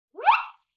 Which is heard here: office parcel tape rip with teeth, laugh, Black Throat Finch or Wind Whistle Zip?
Wind Whistle Zip